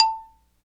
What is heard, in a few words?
XYLO, Afro Xylophn